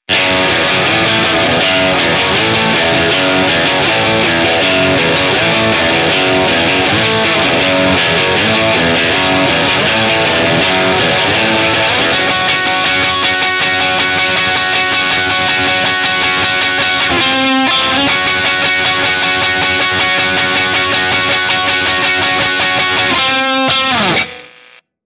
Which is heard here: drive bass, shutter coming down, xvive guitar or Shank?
xvive guitar